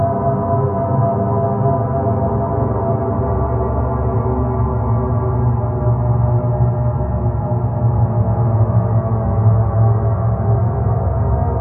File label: Dead End Drone Amb; Warframe; Orokin